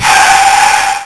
frein

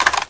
sortie

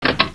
deverouille, weapons

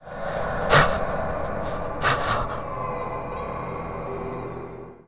Souffle

